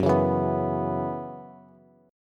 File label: F sharp 7th Suspended 4th, down, Bass; strummed